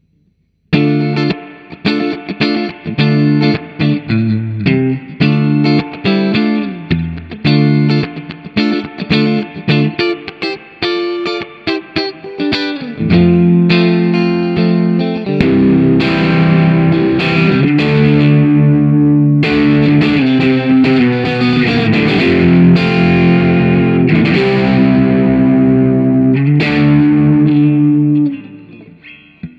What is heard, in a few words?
STBS neck middle